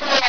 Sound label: close